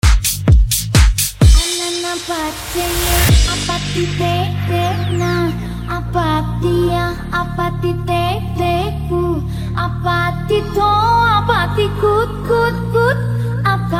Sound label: Mash Up Accapella Basshouse